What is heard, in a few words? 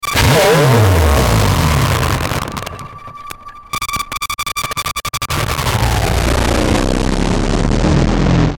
Distortion Feedback Suspend Glitch Power Down; Blastwave